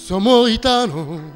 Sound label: FLAMENCO VOX; Best Service; World Colours